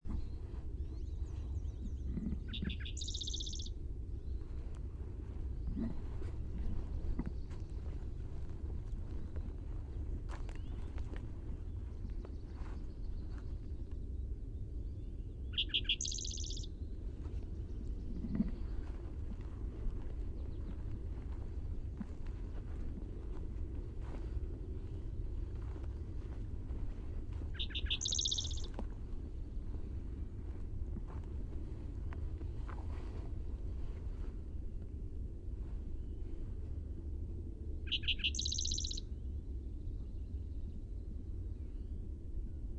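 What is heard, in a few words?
Spotted Towhee Primary Song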